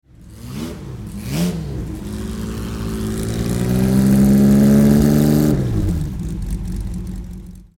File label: Lister Jaguar